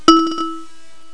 xphone

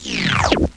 Electric Slurp

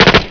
steyer; SpecOps2 MPlayer Demo

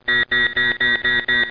ALARM; Atomic Clock 6

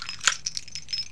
shells; Kingpin; pickups

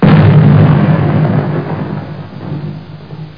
explo; PCMania; proteus

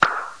Toy Vanish; KidPak; kinddemo

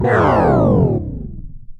LOW; OdjazdowyPakiet; Ultranium

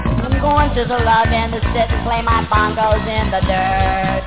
bongos

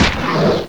Zombie D; fun and bullets

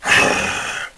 breathe; Exile III v1.0

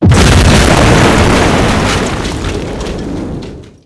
explo metal med; weapons; Spearhead Demo; MacFormat 2003 June

